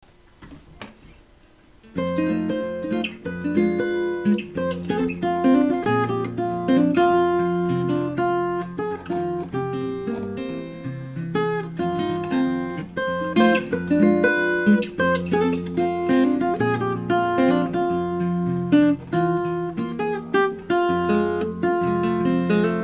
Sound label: Deep River Blues, Guitares